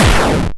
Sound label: hard, explosions